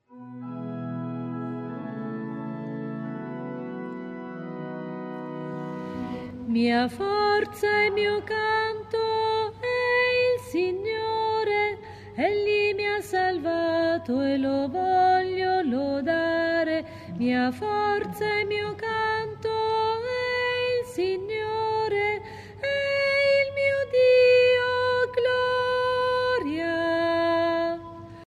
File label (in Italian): soprani